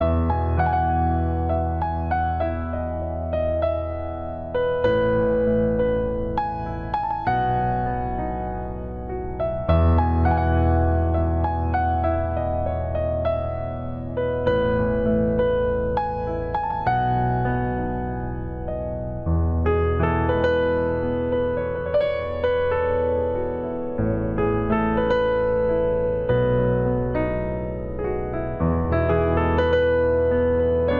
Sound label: Piano Music Ringtone